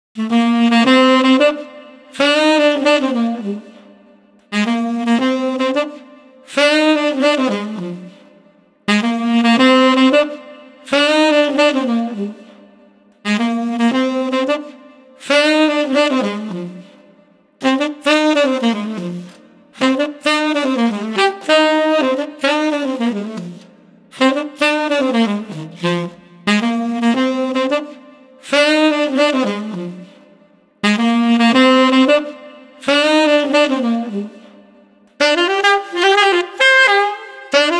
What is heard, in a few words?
Street Sax Soothing 110